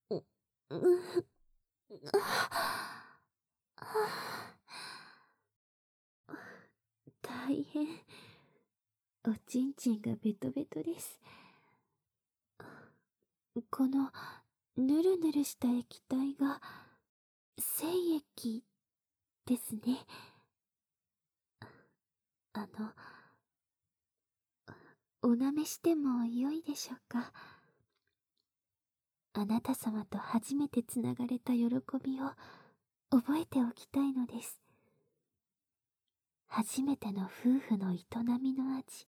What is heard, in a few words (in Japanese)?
お掃除フェラ